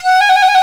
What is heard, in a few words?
FLT FIFE, British Fife, Fife Trills; Roland LCDP04 Orchestral Winds; 90 s Sample CDs